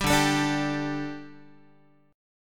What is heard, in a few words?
D-Suspended 4th Guitar, Dsus4 chord; strummed